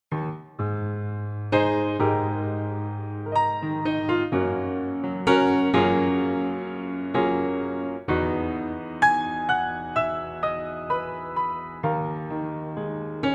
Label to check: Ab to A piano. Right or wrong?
Right